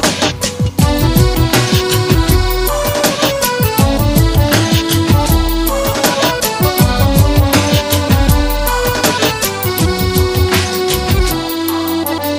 beautiful bgm ringtones

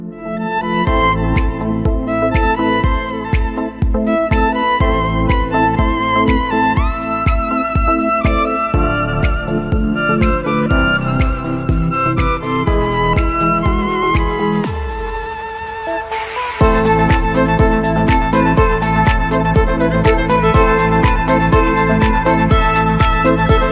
bella ciao instrumental ringtone

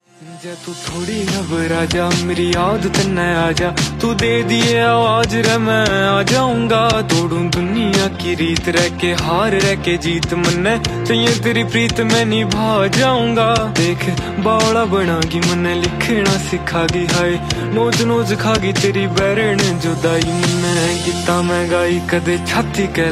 song ringtone

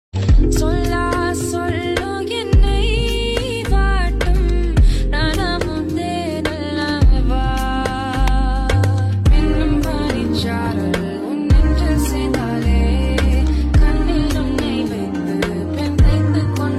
slowed reverb ringtone

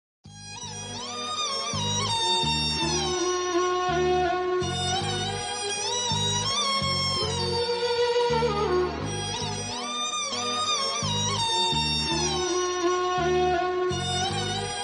mass bgm ringtone

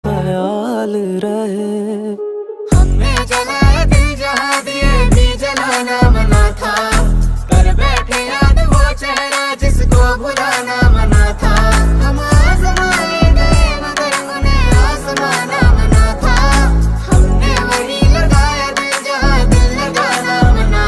ringtone

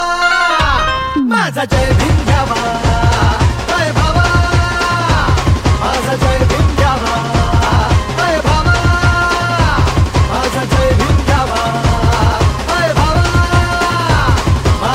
ringtone Marathi

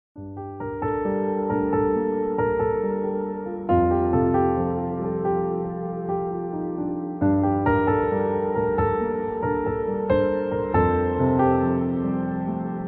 Dhun Song Instrumental Ringtone

